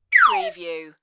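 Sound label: Slide Whistle Dive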